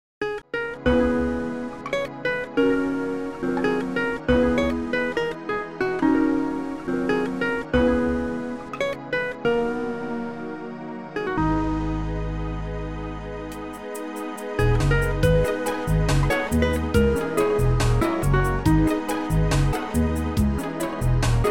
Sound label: LATIN RHAPSODY